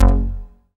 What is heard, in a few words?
K-2000, Synth Bass; BA1 Synth-Basses; Sound & Vision - Gigapack I CD 2